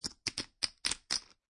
Poker Chips Stacking

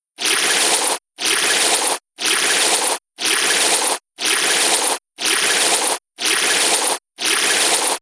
Loop Heavy Laser Squiggle; Laser Sound; Looping

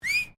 FEMALE WHISTLE SHORT; Female vocal sounds; Voice

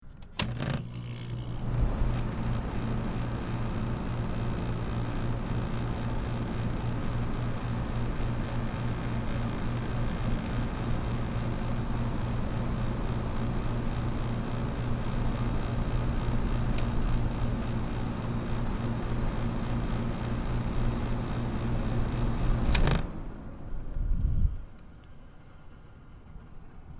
Air Conditioner; Air Conditioner Low Setting; Household; Air Conditioners

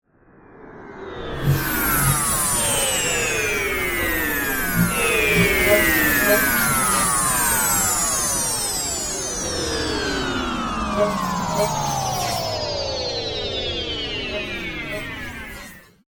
Scanner Beam Sweeper, Scifi, Descend; Science, Fiction; Tech; BlastWave

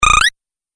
8 Bit Style Rapid Spring; Video Game

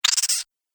Cartoon Rodent; Cartoon Sound Design Sound Effects

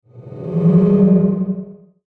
Sweeper Ghostly Tremolo Swell; Production Element Imaging Element Accent Transition; Sweeper Sound Effects

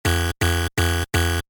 Electronic Alarm Loop; Alarms; Electronics; Stuart Duffield

